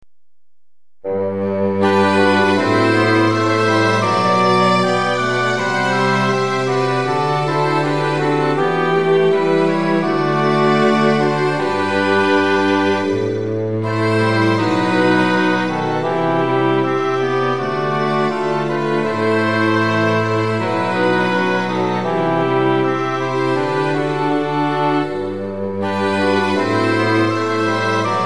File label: Midi Ob Ob EH Fg VC QN 80; No.10-Var.8